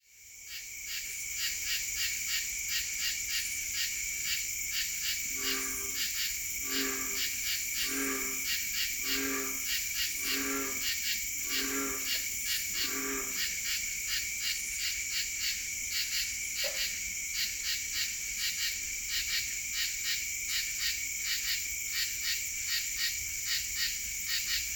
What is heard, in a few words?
true katydid chorus